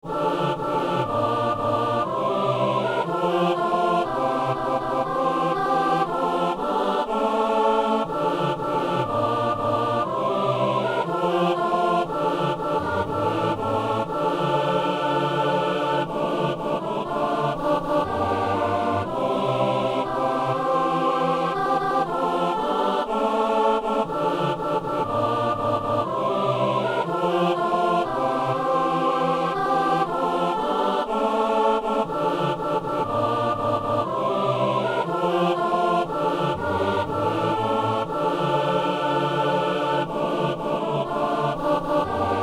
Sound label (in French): messe sanctus; messe de saint jean